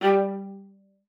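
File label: G5, viola spiccato; Xsample VOL-5; 90 s Sample CDs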